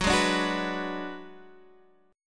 D 6th Flat 5th F sharp

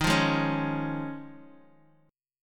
Eb 7th Suspended 2nd Suspended 4th, Bass Standard; 23,20,18,18; Eb7sus2sus4 strummed; JGuitar

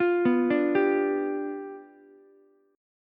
G 7th G down Ukulele; G7 arpeggiated; JGuitar

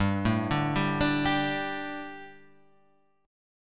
G Suspended 2nd, Gsus2 chord; arpeggiated; JGuitar

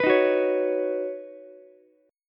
Db 7th Suspended 2nd Suspended 4th, Ukulele; JGuitar

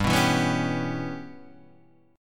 G 6th Flat 5th, chord; G6b5 strummed; JGuitar

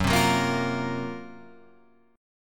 F sharp Major 7th Flat 5th

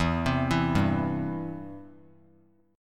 E Minor Sharp 5th E down; Em#5 arpeggiated; JGuitar

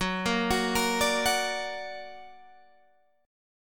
F sharp Augmented F sharp Guitar Open G; 16,15,16,15,15,16

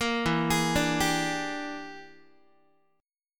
E 7th Sharp 9th Flat 5th, Guitar; arpeggiated; chord; JGuitar

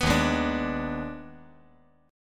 Eb Major 7th Suspended 4th Sharp 5th Eb; strummed; EbM7sus4#5

